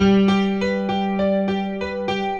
KEYB A5, ROCK POP, KEYBOARD; MAGIX

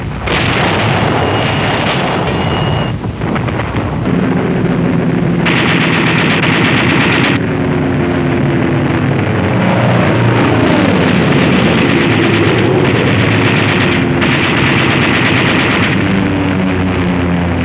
battle; warbirds

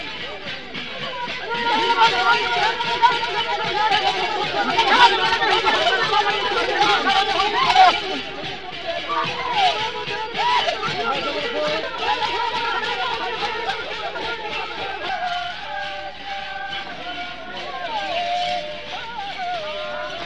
WATUSI

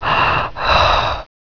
GASP

